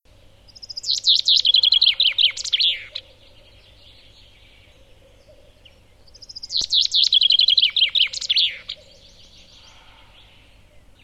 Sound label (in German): Buchfink